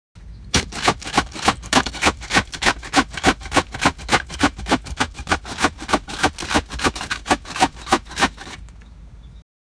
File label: sheers cutting a plant do, garden